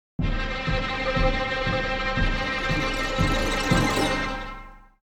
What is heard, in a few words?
Drama string suspense symphonic build up